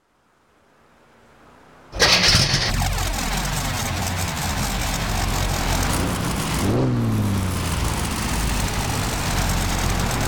dino 246 gts; TDU-1

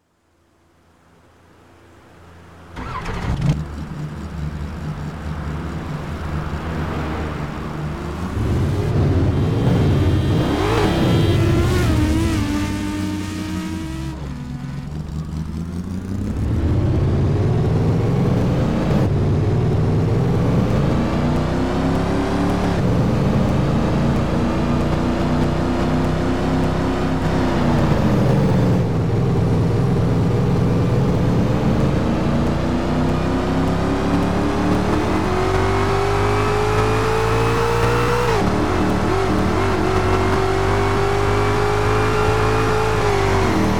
ford gt; tdu

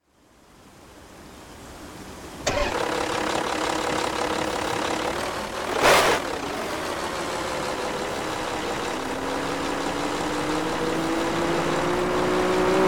audi a4 2.5 v6 tdi diesel

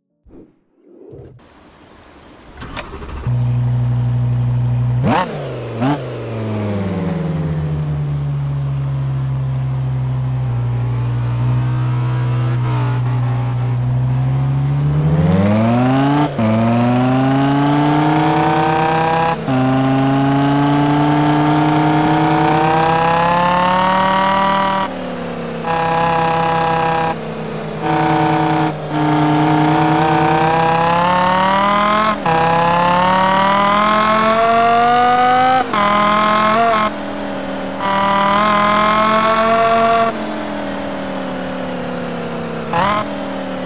bmw m5 e60; tdu

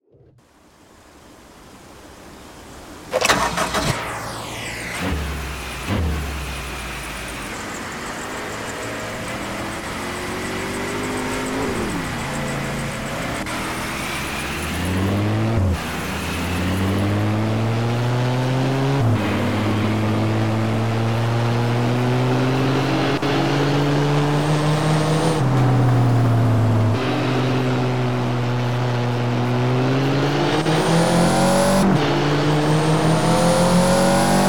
honda civic hatchback b18c vtec; 1994; TDU-1

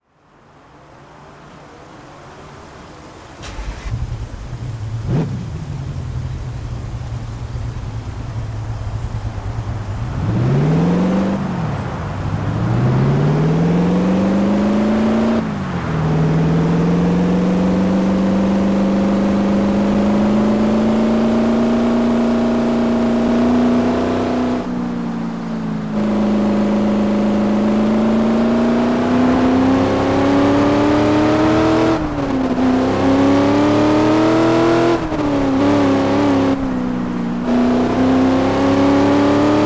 mercedes-benz c 63 amg; 2012; TDU-1

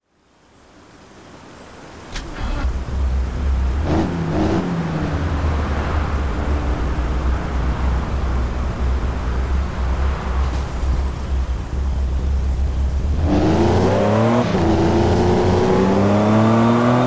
bmw m3 e46; 2006

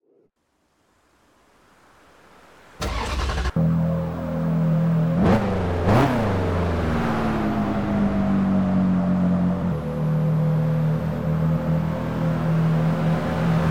ferrari 599 gto

